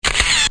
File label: shutter, camera